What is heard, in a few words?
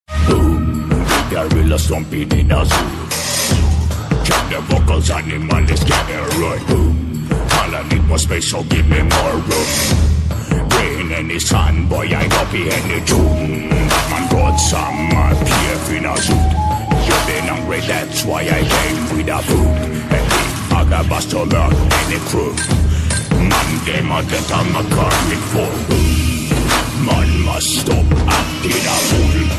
Ringtone